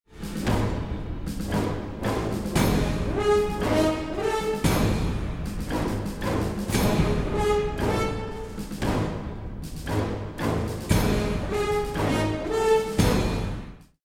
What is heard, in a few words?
choose team; music kits custom